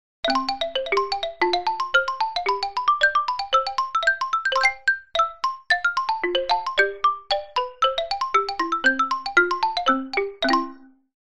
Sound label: Orchestral Mallet Xylophone; Drumwavy; Syntheway